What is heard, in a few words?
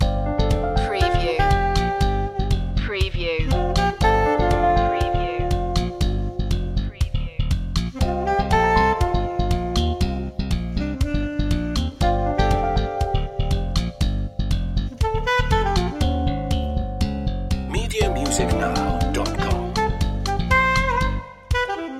slap bass loop